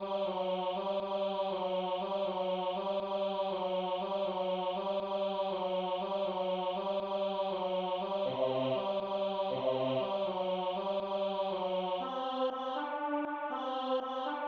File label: 2e. Tenor; B.Chilcott